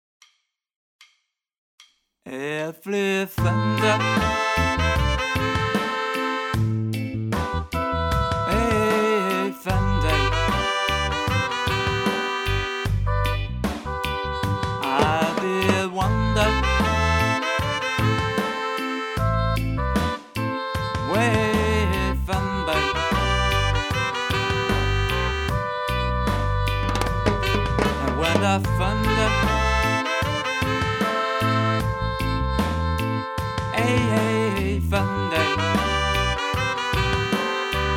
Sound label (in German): Reggae Gesang